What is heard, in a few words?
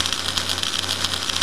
welding; Roland - LA Composer vol. 1